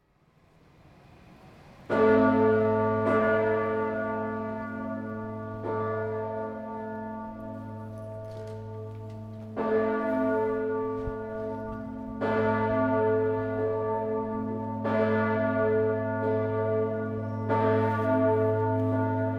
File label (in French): cloche; Sursee cloche-1; Assemblée générale 2013 de la GCCS à Willisau (LU)